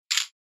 Camera Phone

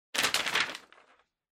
Flyer Flip

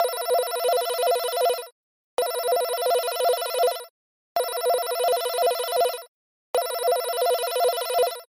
Warning Alarm

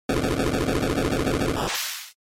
Defeat, NES RPG

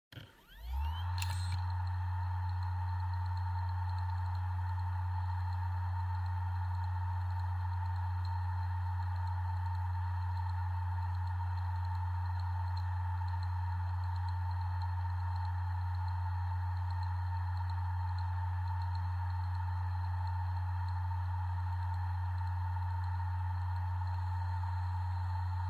Hard Disk Drive Operating Noise

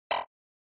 SNES Shooter Damage

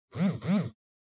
Cell Phone Vibration Cushion

